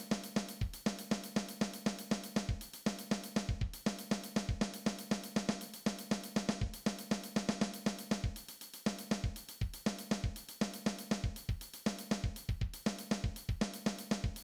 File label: drum attack